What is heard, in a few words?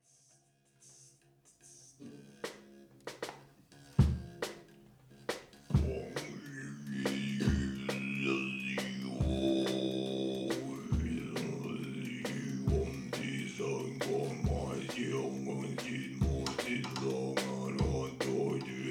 Drum And Space; Cube Orchestra; Rehearsal